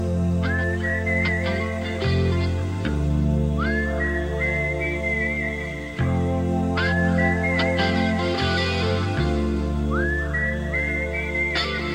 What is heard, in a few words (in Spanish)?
Tono Silbido